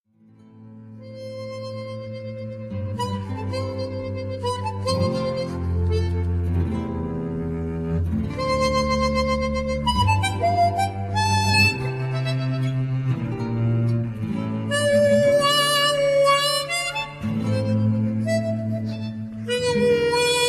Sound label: tango